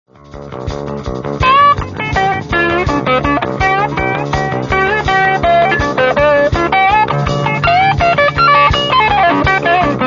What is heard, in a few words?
harmonic